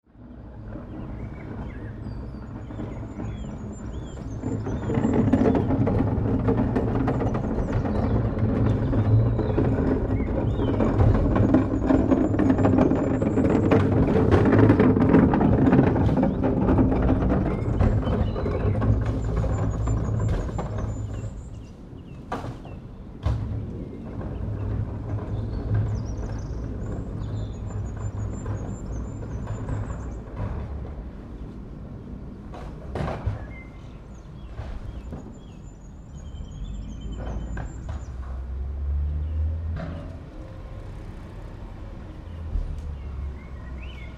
Dustbin Collection, Bins wheeled up - Truck up stop and process rubbish; drive away; Trucks; Vehicles